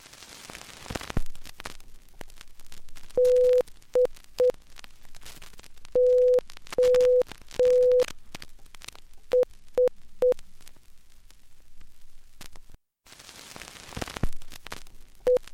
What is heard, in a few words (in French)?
Enregistrement sonore, bande magnetique